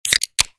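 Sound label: opening can; ODPIRANJE PIKSNE; Efekti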